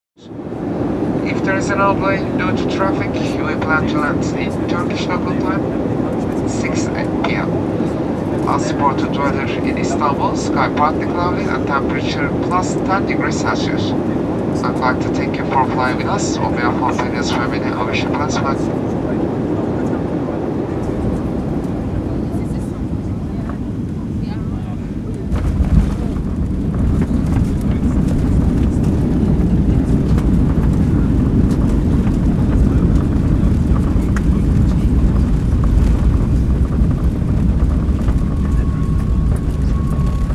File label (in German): Landung Istanbul